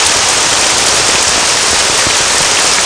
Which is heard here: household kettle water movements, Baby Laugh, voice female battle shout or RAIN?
RAIN